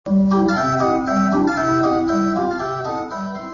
jingle orgue barbarie court

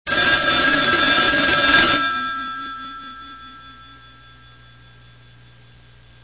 sonnerie teleph

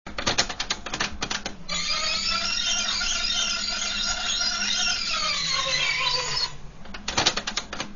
texture rembobinage

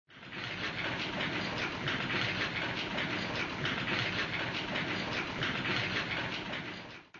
machine bruit, selection mixage audio

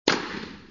texture service tennis

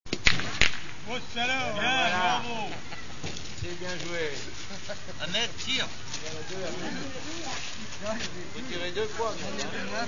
ambiance sport exterieur

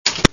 texture touche

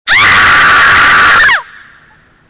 vocale crie milier allonge

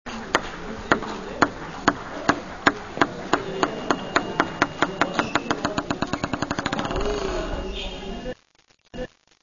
battement balle transition

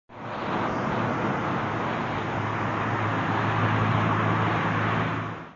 ambiance sortie commis trafic

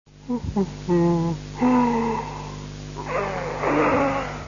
vocale baille 2

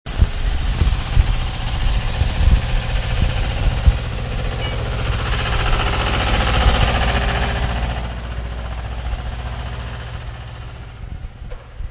machine travaux

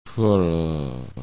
vocale boire pff

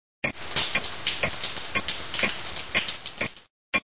texture réveil douche

